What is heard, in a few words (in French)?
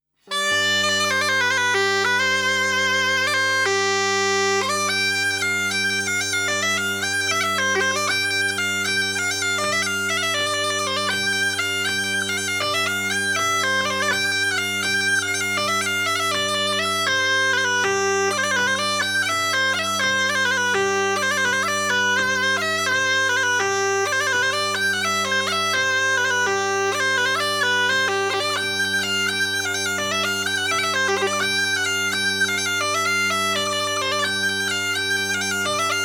Bourree; Bourree de Demaison